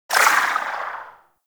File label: Sapient Pistol Tail; Warframe